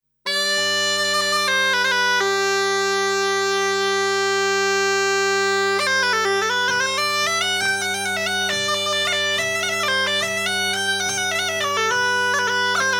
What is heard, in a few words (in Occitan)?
Borreia; Borreia Charmelada